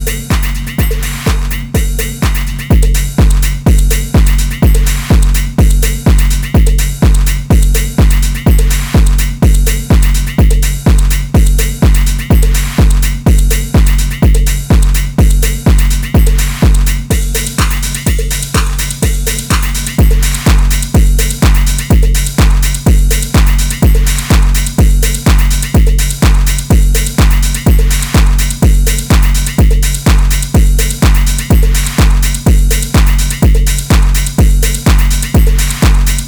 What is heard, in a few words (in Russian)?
chisto bass